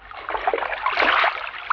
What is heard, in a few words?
splash; Active Worlds